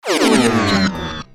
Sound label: electrical